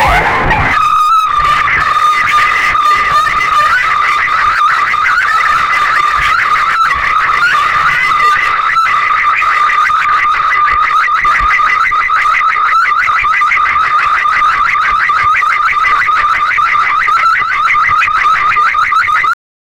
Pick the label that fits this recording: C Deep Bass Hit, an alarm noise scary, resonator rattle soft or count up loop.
an alarm noise scary